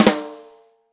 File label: rock snare chromatic, real drums; Best Service ProSamples vol.43 - Real Drum Kits